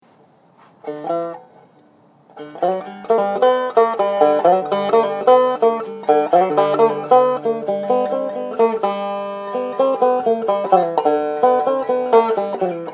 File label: riff